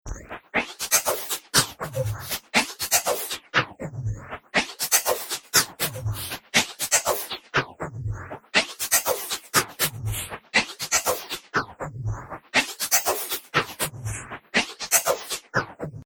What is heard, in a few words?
120 Bpm Beatbox Loop, Vocal Loops; Percussive And Rhythmic; Seamless loop